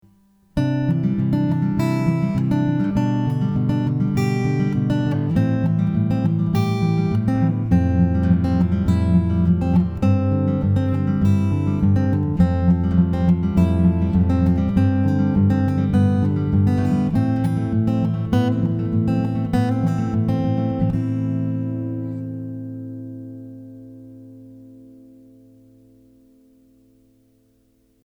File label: acoustic fingerpicking, guitar